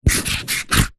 office marker pen highlighter luminous draw square on cardboard